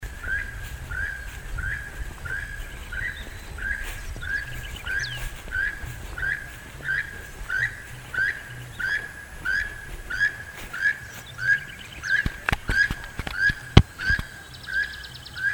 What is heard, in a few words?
Keel billed Toucan Ramphastos sulfuratus; Sonidos de Pájaros